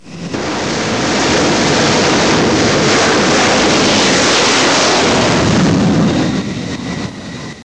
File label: flyby; Digital Ranch; Spectrum Holobyte; ambience